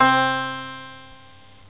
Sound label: harpsichord, piano; GMPlay